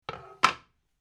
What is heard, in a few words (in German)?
brett ablegen